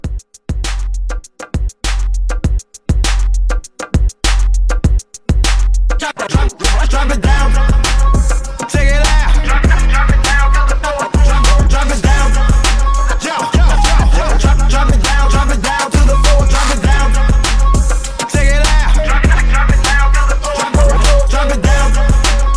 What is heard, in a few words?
Hype Re Drum 100